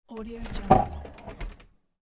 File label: garden shed old door opening; domestic sounds